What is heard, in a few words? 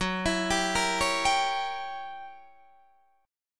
Fsharp 7th Flat 9th down Guitar Open D